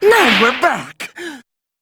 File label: standup